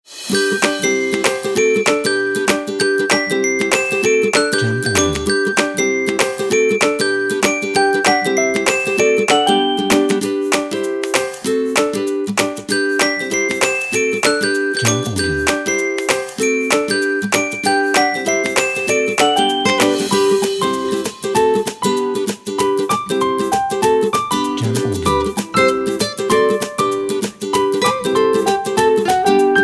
Kids Comedy Acoustic

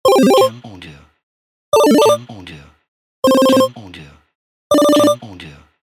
8bit Sounds

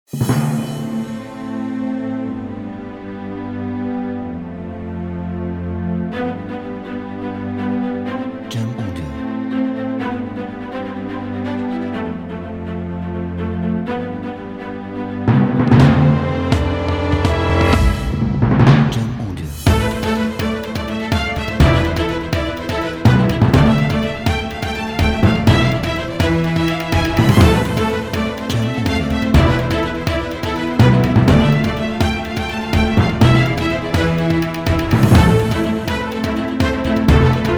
Inspiring Cinematic Trailer

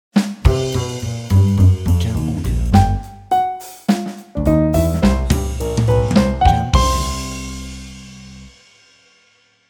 Jazz Swing Logo

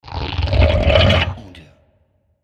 Monster Horror

